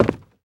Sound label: wood, footsteps; player